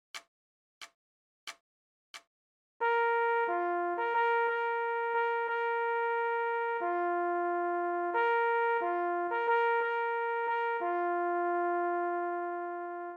Leggiero - Signalhorn

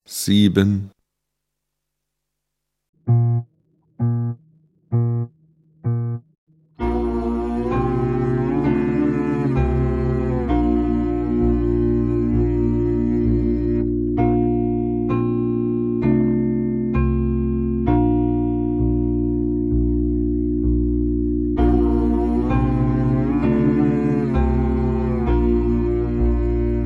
Klang, Hornschule

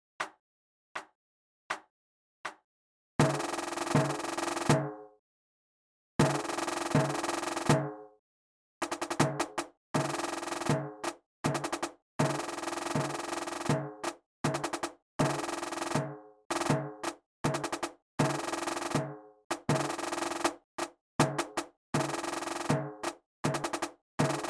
Perkussion, Spielmannszug; Edinburgh Tattoo; Scotland the Brave

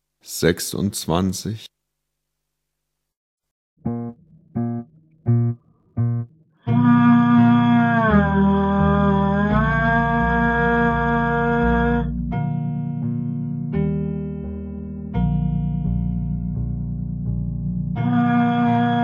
Klang, Hornschule

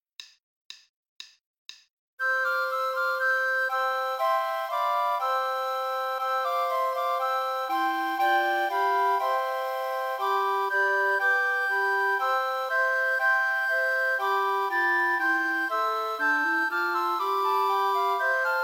Floeten, Spielmannszug; Froehliche Weihnacht

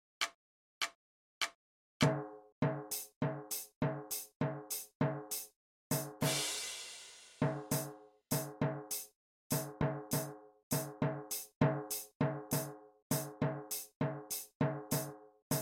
Jubilaeumsgruss - Schlagzeug [100]

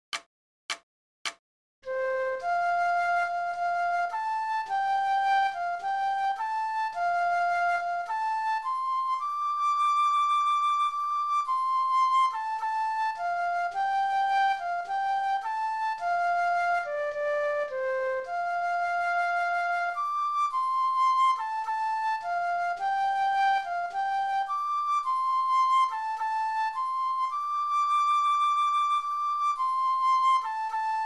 Auld lang Syne - Floete; Edinburgh Tattoo

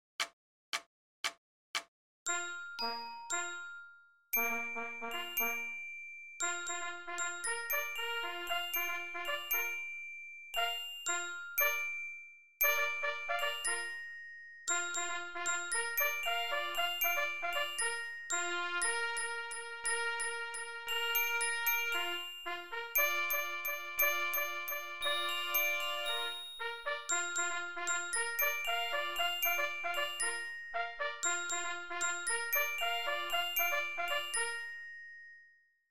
Lyra

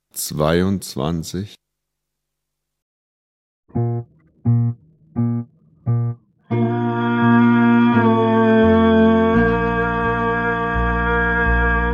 Klang, Hornschule

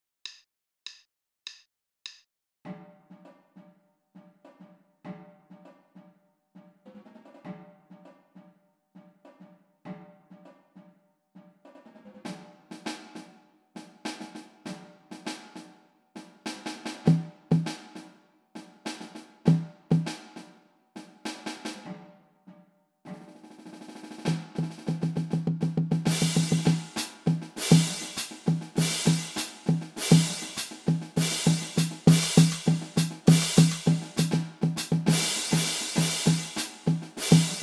Schlagzeug

